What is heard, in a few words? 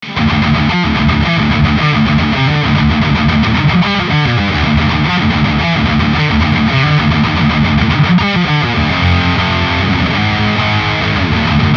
metal tone